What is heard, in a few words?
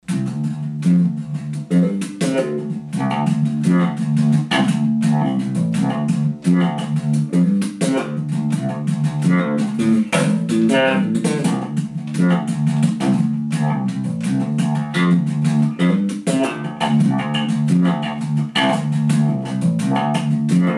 wah